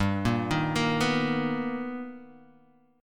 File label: B Augmented Major 7th G, chord